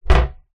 household empty unit wooden small cupboard close recorded inside